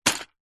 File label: toy lego impact many pieces in box